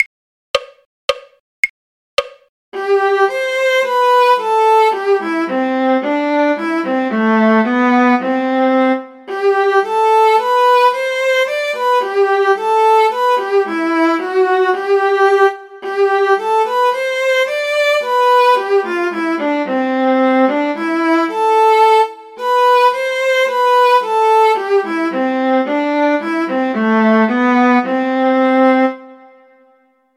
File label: viola; Sweet Polly Oliver; folk